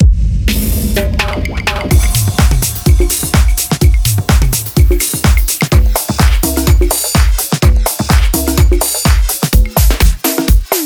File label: Drums